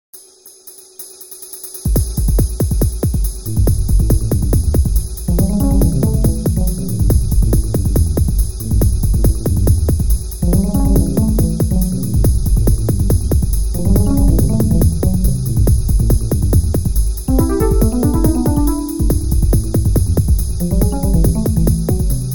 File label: Unplugged